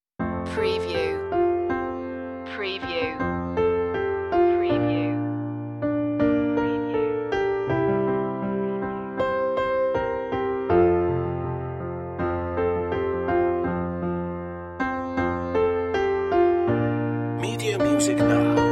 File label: jingle bells piano 80bpm